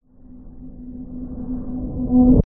swell tonal dark muffled with rumble transition reverse; Skyclad Sound